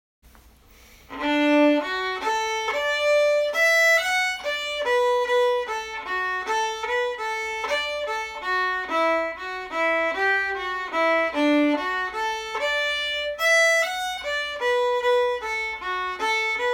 slow; jerrys beaver hat

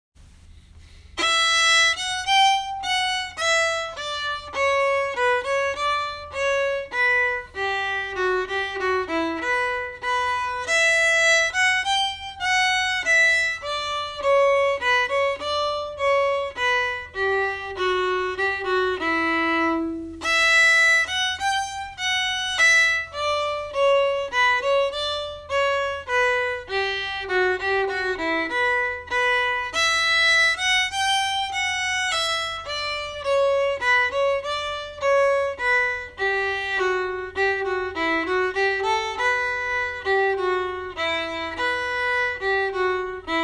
bouree slow

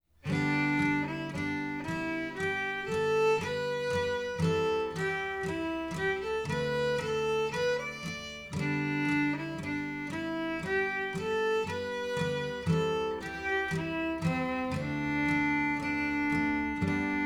slow

